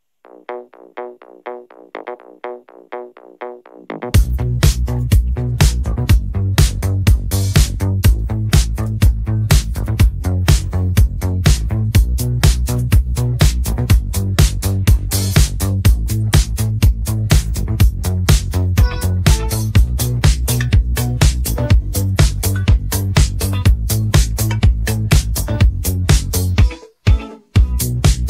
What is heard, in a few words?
italodisco instrumental